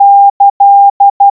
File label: wabun ki, morse signal